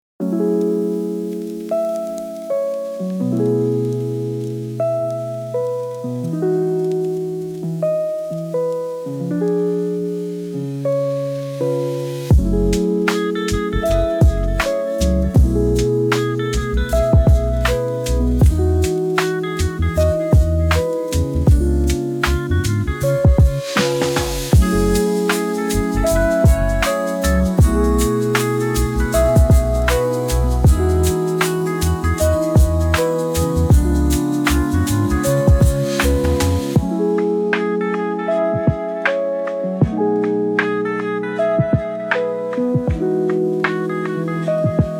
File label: sunrise melodies instrumental music